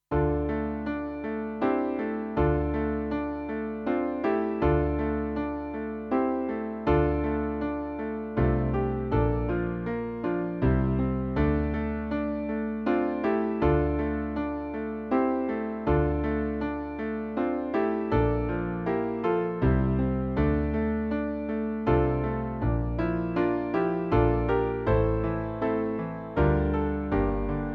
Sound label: Backing